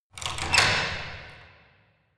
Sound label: locking church door